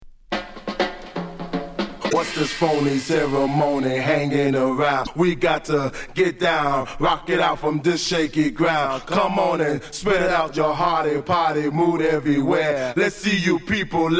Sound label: Acapella